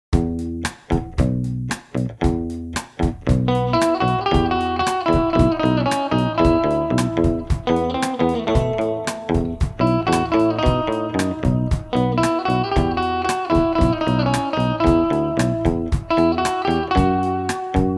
retrobass

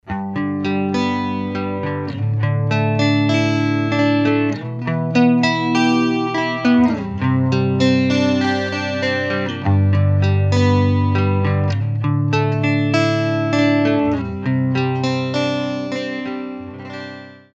eterna vox neck

